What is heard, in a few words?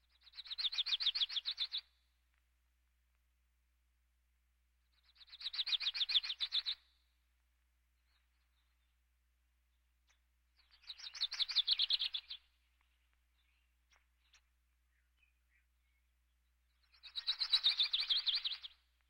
Birds